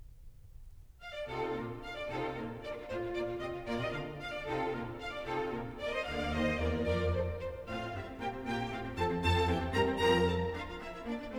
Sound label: Presto, Final Movement to a Sonata for Piano in Bb; Wolfgang Amadeus Mozart; Rarities & Surprises; London Sketchbook